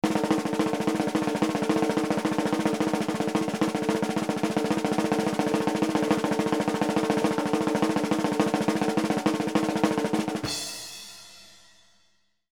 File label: Drum Roll; Open Drum Roll , Ends With Crash Cymbal; Cartoon; Cartoon Sound Design